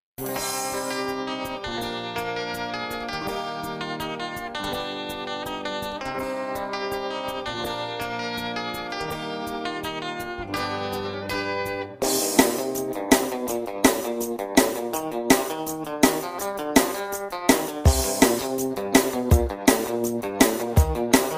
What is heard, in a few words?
speed up nightcore